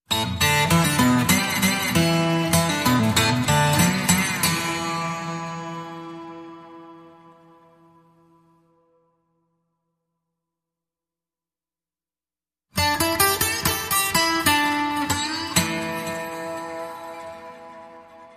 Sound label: Outlaws Bed Dustbowl Rattle E minor, Guitar Only